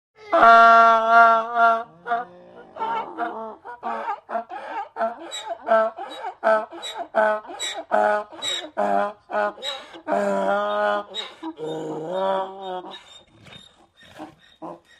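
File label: Donkey Snort Multiple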